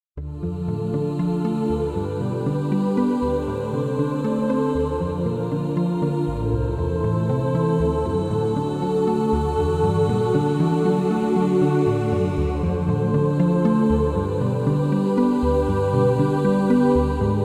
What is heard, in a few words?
Alefgardian Ambience